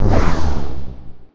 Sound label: Magic DISPEL